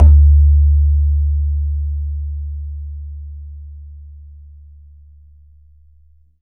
BAYAN, Drums Percussion

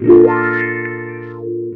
GTR CHORD; Univers Sons; Jazzistic

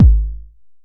Kick, retro house samples, Drum Hits

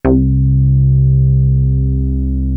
MOOG BAS; Zero G; Man Machine

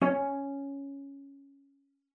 C#4, CELLO PIZZ; 90 s Sample CDs; Xsample VOL-5

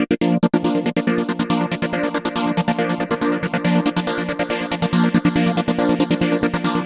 Chord Seq; Dr. Fink's Funk Factory

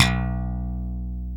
B 0 SLAP, SLAP BASS; AKAI S-Series CD-ROM Sound Library VOL-7; SLAP BASS 1

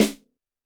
Snare, Roland TD-20

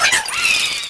Fan Chant; Mario Paint

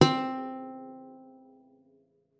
C4, GUITAR; Xsample VOL-2

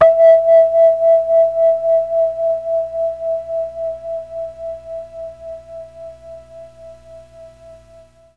E3 VIBES, Mellotron MKII; Mike Pinder - Mellotron Archive CD

